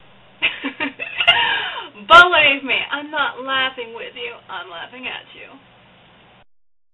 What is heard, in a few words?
laughing at u tiny; Financial Domination